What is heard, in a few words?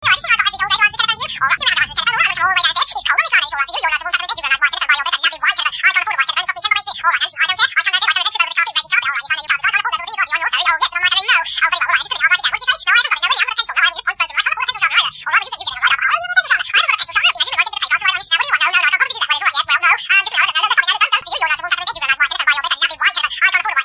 Phone like in Movie